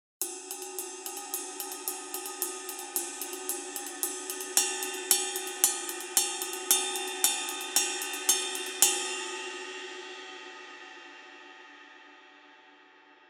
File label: Custom Ride 22 Rock